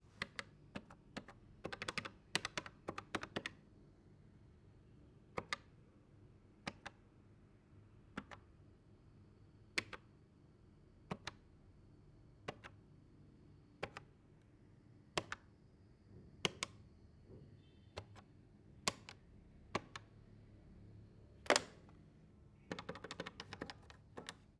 Telephone, Dialing, Hotel, Vietnam

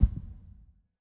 Photo General Place

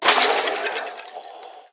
Skeleton Death

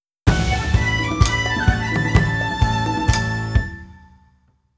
Transition; Ogre Oustin'; Pokémon The Unreleased Tracks

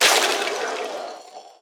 Skeleton Death

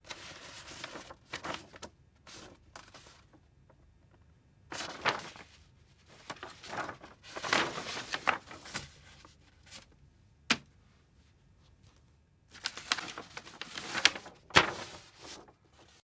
AMB China 3D Amb; Rabbids Multiverse

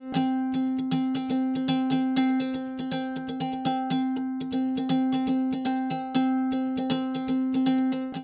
guitar atmo 16 beats

